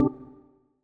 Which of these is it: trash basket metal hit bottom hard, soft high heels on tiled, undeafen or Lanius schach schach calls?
undeafen